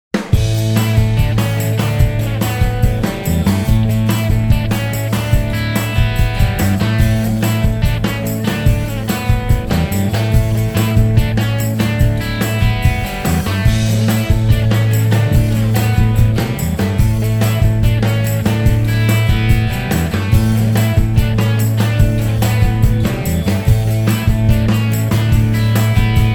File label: 12 Strings